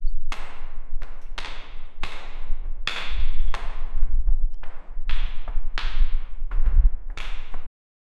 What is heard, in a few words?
fast objects in the corridor